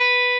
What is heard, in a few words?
Arp